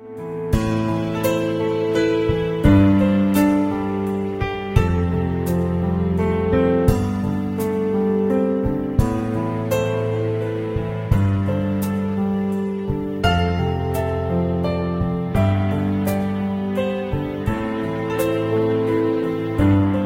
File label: BGM sad; witch spring 2 android ios mobile gamerip 2016